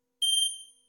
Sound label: Pan Flute; InventorLabs; TECHNOLOGY